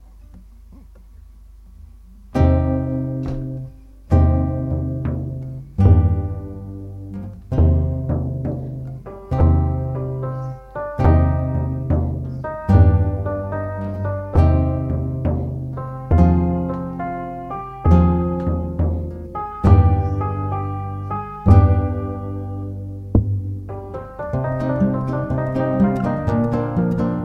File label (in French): instr.